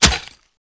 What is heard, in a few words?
knife hit, weapons; garrysmod